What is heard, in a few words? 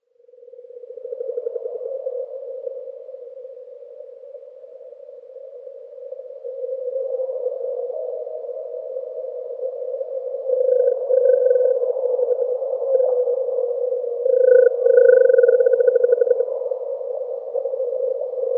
Hooded Merganser male